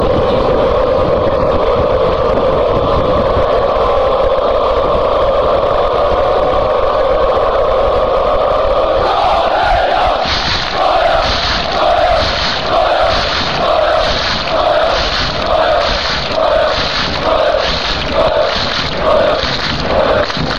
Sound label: shouts, fanchants; poznan